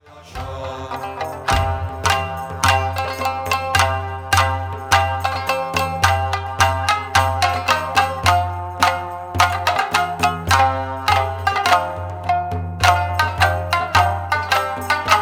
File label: resonance sample